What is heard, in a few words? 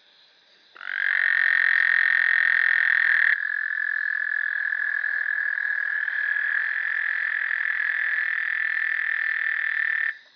Bufonidae Incilius valliceps